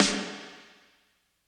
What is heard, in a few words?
80s reverb snare